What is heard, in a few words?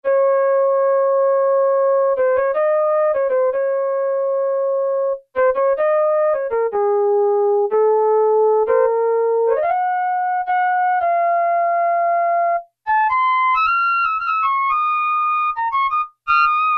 FLUTE 1, Yamaha DX7